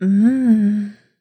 Mmm Tone Message Ringtone

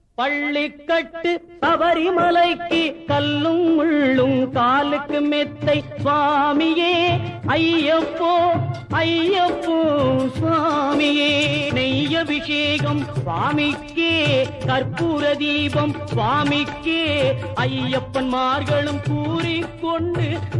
Ayyappan Tamil Bhajan Song

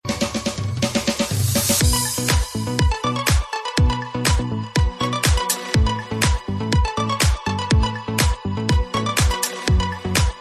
Drum Dance

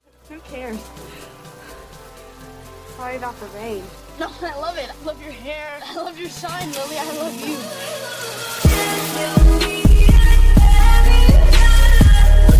Arabic Song Ringtone

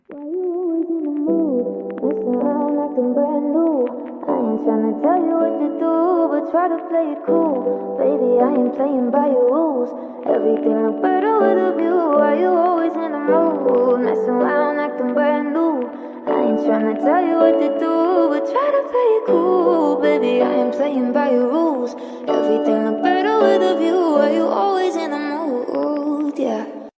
English Ringtone

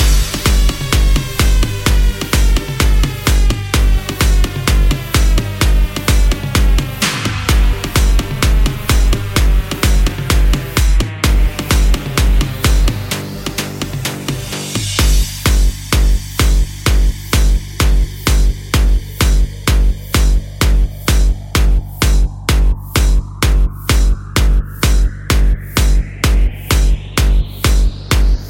Non Stop Bass Music

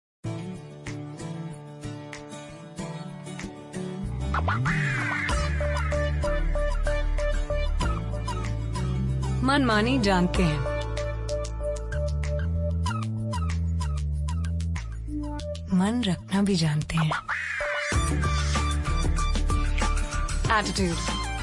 Attitude Ringtone; Amway

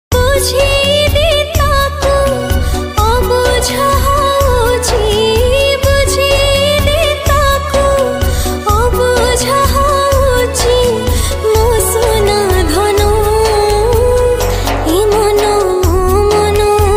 Odia Female; Ringtone

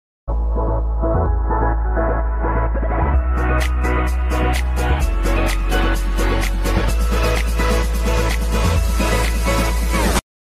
Music Ringtone

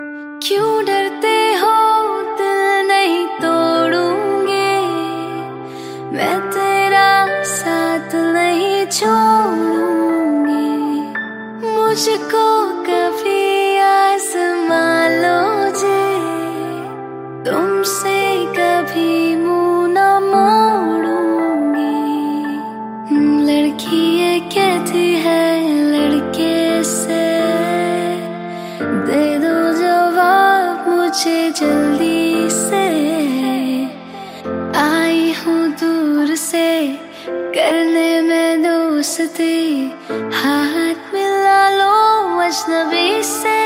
Female Ringtone

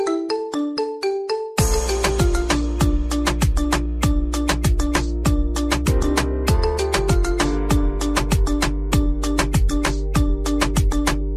Marimba Remix Ringtone

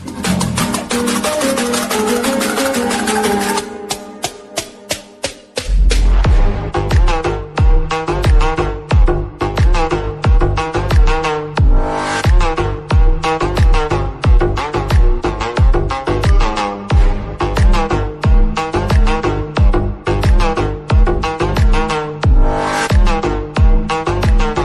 Best Arabic Music Ringtone; ringtones

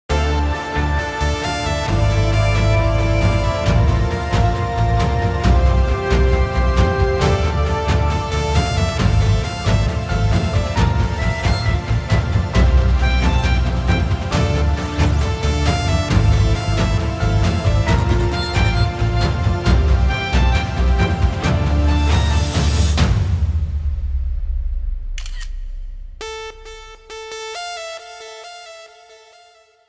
Lava Z61 Ringtone; ringtones

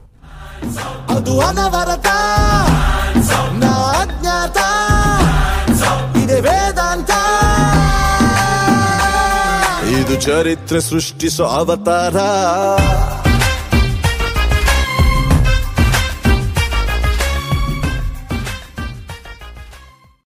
Kannada Song Ringtone

